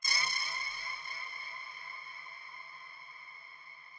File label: ghost